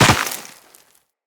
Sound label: Tenno Land Dirt, Footsteps; Characters